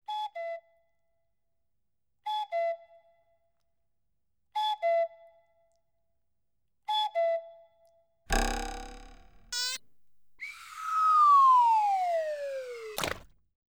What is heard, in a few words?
Cuckoo Struck Dumb; BBC Sound Effects Library